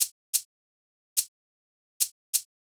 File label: shaker